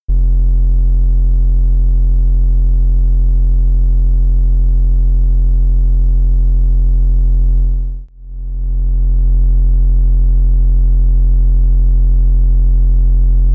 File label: DFAM vs Edge Oscillators